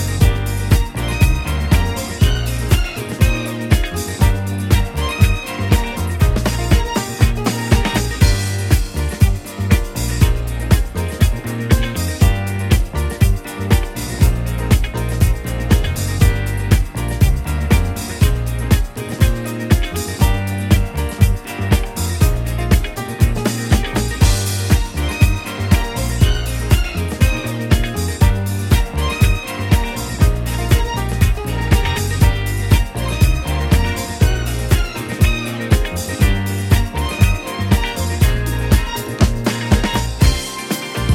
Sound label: Instrumental